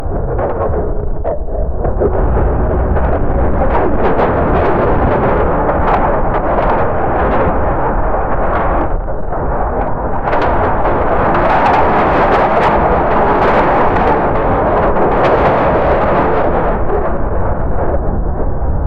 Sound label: war sounds shooting far